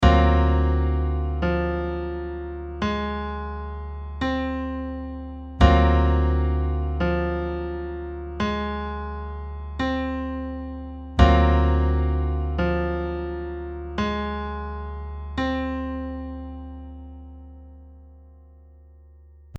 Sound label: F minor over D7